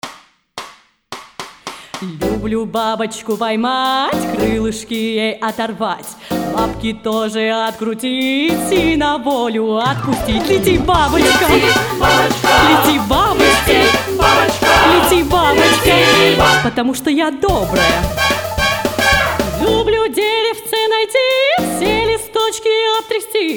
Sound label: rock n roll